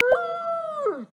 Wolf; South West Mountain

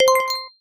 drumset etc, keytone; SKY IM-A770K-VEGA-Racer

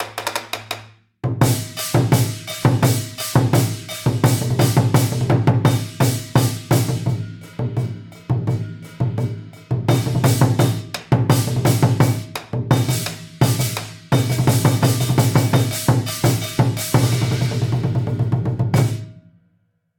lion dance, ringtones; Google; Google Sounds; Seasonal Celebrations

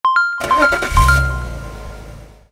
Car message; Nokia; Audio themes

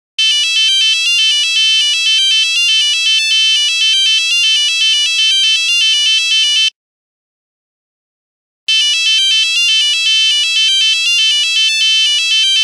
Nokia Buzzer; 3210; Windows Phone apps

